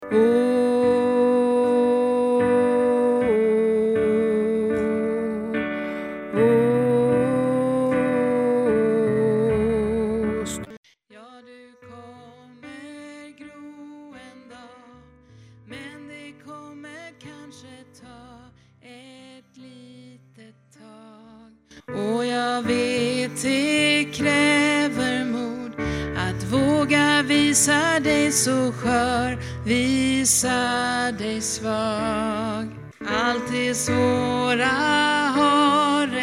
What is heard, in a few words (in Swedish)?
sopran